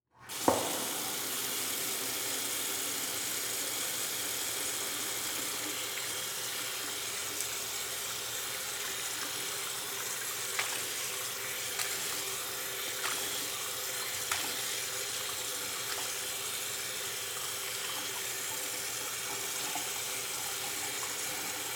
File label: Liquid Water Water Faucet Running In Public Bathroom